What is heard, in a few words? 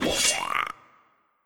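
Skeleton Warrior Thrust; Skelleton Warrior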